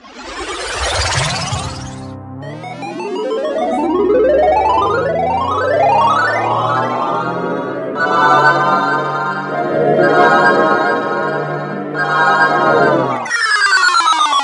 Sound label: arcade